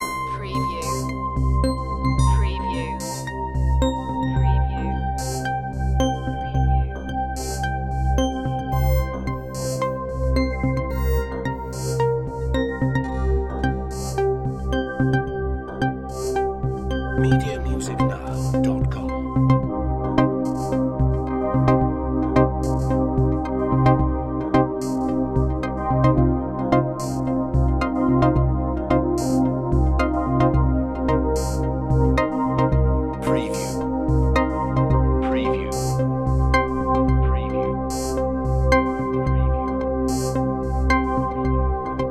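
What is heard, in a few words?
Production Element Bed Synth; Sound Effects Bible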